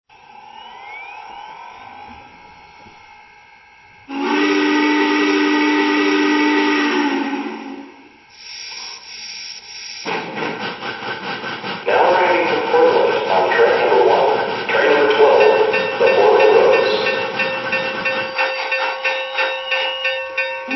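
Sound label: Challenger, trains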